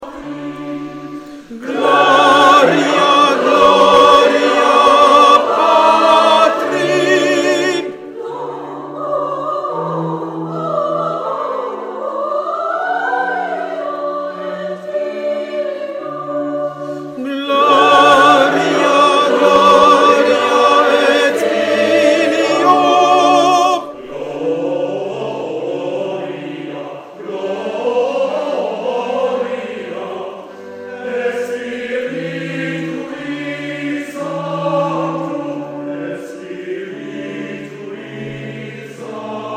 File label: tenor